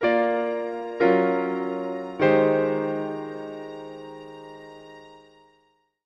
Music Logo Piano Pad

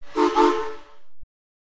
Steam Train Whistle, horns

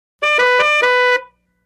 Old Car 2 Tone Fanfare

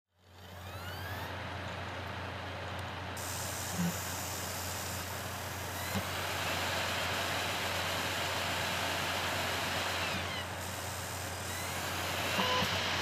Computer Cdr Reading

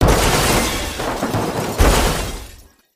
Car Rollover

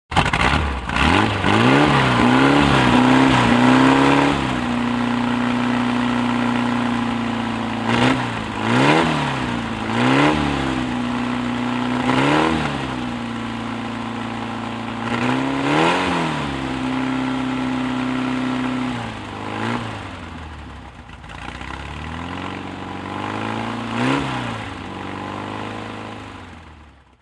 Gas Engine Start Rev; Start / Rev / Idle / Off, Medium Perspective.

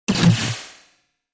Hit Dbl Laser Fire

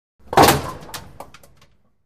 Bowling Ball Rolls

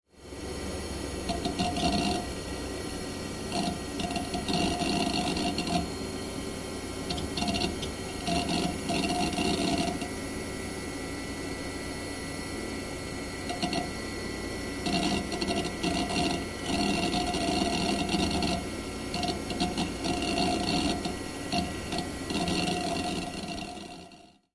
Computer Pc Reading

